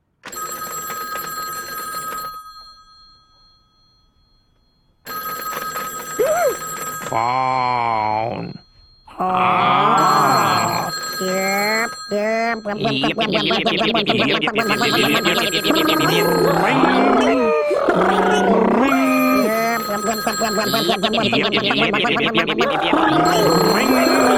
clucking at phone; drawn together

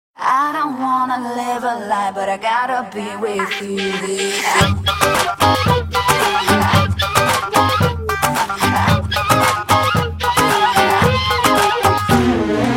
turkish

